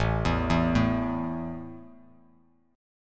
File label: A 5th A down Bass Standard; arpeggiated